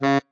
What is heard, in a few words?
marimba bat; ゆめ2っき